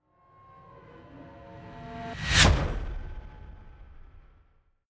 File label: fly by hover car hum high; swooshes-swishes